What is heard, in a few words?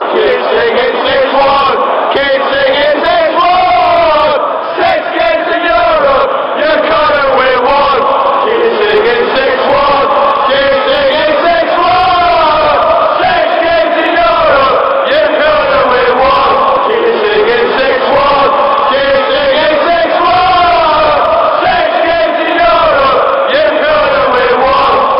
keep singing, fanchants